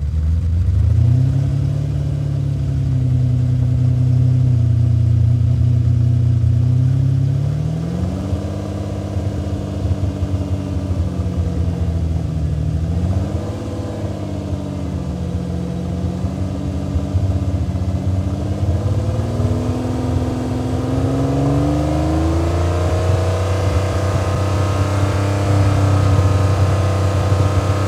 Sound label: Lamborghini Fast Idle Off, Automobiles